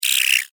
Alien Chirp, Scifi, Alien, Chirp, Science, Fiction; Aliens; Blast Wave